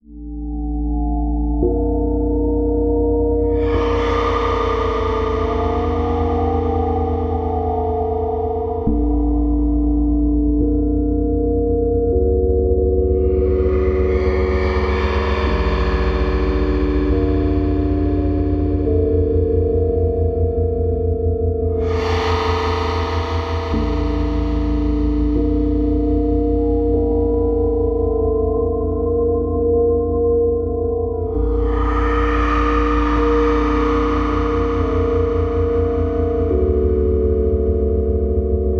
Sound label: Drone Spooky Bed; Production Element Imaging; AdobeCC